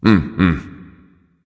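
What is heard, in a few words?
non-verbal agree; smite; voicepack; houyi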